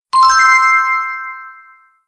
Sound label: ammo; cowboyrun